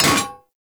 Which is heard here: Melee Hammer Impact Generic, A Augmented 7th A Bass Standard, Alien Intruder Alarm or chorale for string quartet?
Melee Hammer Impact Generic